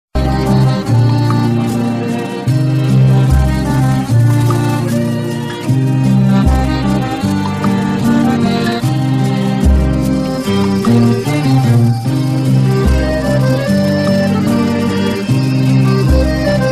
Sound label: instrumental